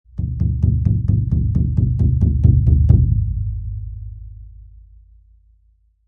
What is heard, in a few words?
Cartoon Drum Bass Pound, Bass Drum Pound: Several heavy knocks; Comedy/Cartoon; Funny Sounds; Lynne Music